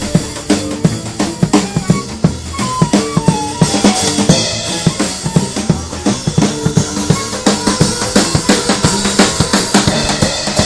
jazz on the southbank

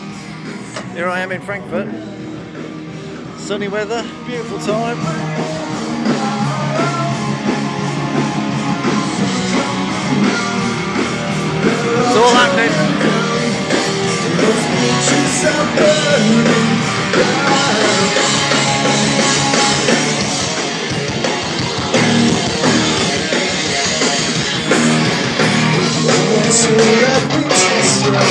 rock in frankfurt

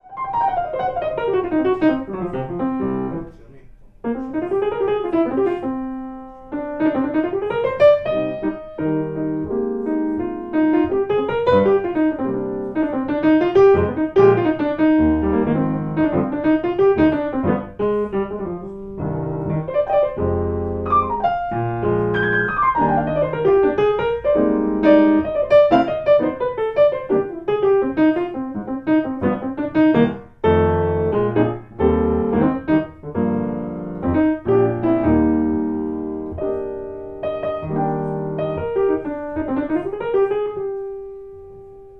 a rather beautiful grand piano; Yamaha Music London

